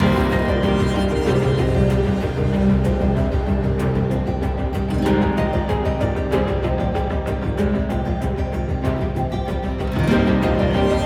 Battle High; Monster Train First Class

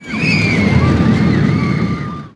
Scream

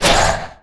Big Swing; Knight Online

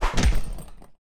Punching Bag; Vana; unsighted

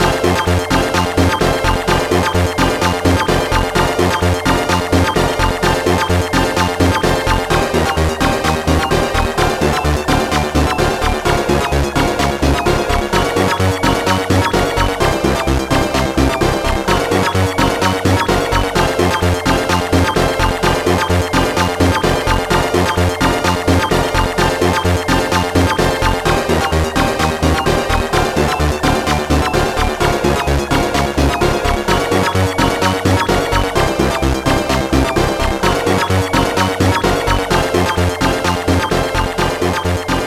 Menu Weapons

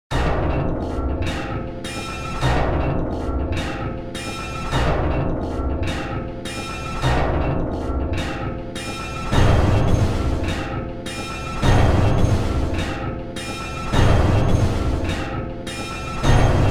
Ringing Of Chaos Bells; silent hill 3

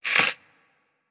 Impaler Earth Out

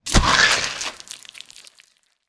Gargoyle Horns Hit

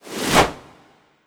Exile Throw

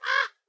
AMB China 3D Amb

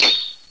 Sword

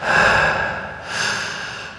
Sfx Basketball

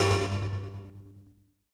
Impossible-Triangle Pizz

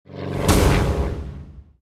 Skill Deadly Flying; starbites

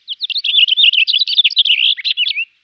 Music Frontend

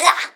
Rogue Attack; Pecheneg

